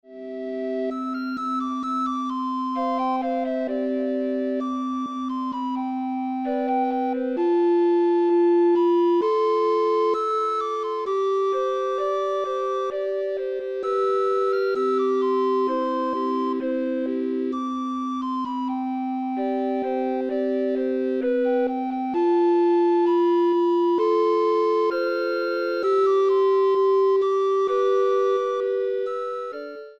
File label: Recorder Ensemble